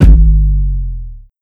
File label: Kick Dist Tick N Bass; Newagesoup